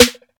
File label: present snare drum e key, Loudest frequency: 2863Hz